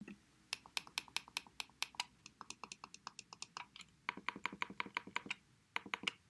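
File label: Logitech M590 mouse wheel, sideways front back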